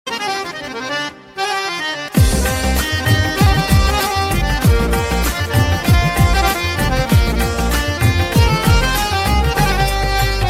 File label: qawwali ringtone, islamic ringtones